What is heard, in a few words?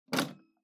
Public Phone Tubular Key Turn